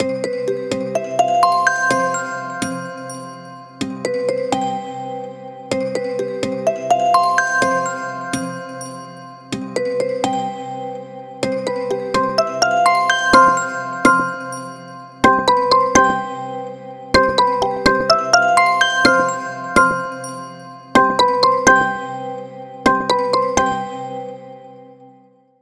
Whirl Of Notes, ringtones; Honor Pad X7

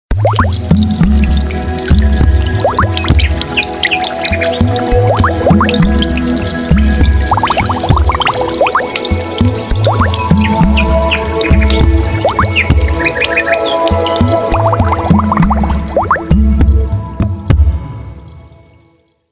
Alarm, alarms; Coolpad 7296s

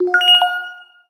Tinkerbell; Explay Golf; notifications

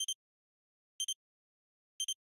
selftimer; FCNT Arrows Alpha

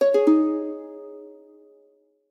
Harp Notification; MobiWire HomePhone 4G; notifications

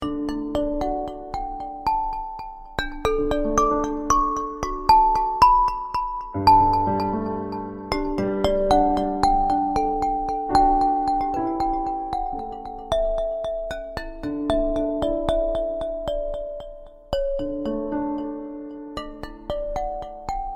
Glass Marimba, alarms; Itel A04

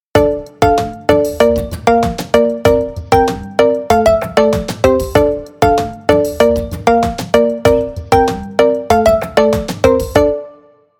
Vibrant, ringtones; Infinix; Note-Edge

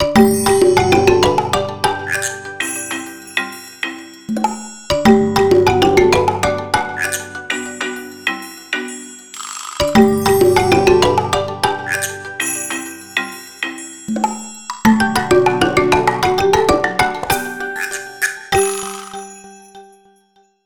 Wood Whisper; Honor Magic 7 RSR Porsche Design; ringtones

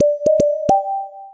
Bubble Pop; MyPhone A919 Duo

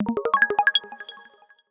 Bubble, notifications; Honor Magic 8 Pro

